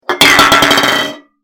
空の缶が転がる音

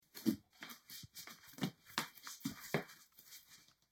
スリッパを履く音; slipper

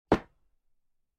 脱いだ服をシーツの置く音

